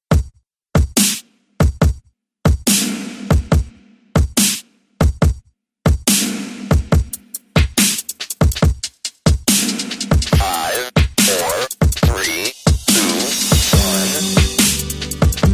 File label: Dubstep Happy Birthday